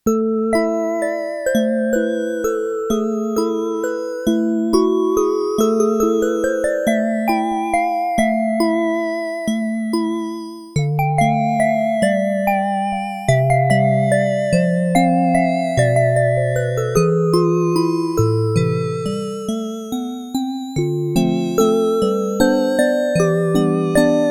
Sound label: Korg DW-8000 SYNTH HARP, Factory Patches